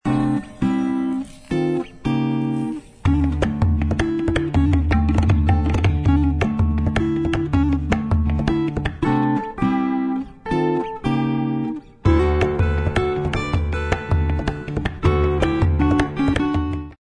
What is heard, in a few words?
chinese garden